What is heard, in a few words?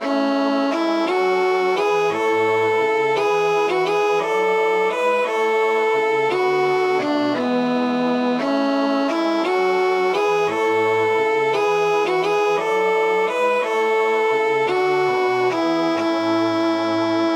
Alleluia celtico